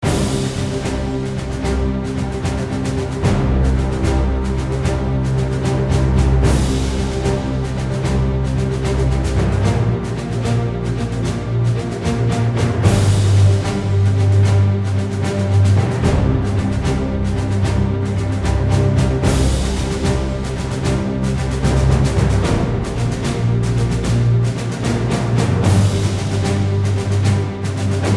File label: strings and perc